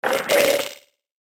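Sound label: mummy pain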